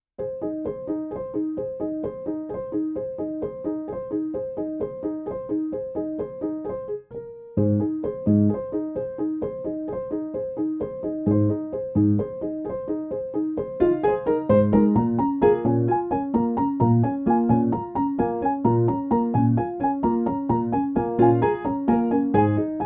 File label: piano version